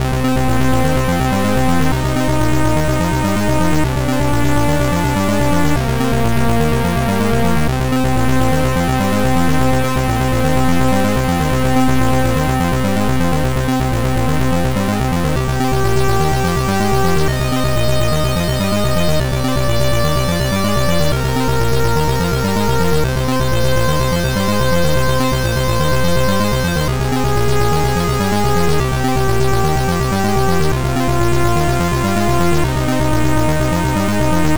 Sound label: amiga in game; chambers of shaolin